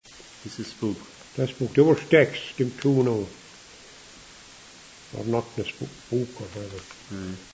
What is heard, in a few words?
Spook sound; Glandine North; Knocknaspooka; CIVIL PARISH OF KILFARBOY - R.C. PARISH OF MILTOWN MALBAY.